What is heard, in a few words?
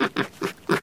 mtushkano idle; STALKER; customnpcs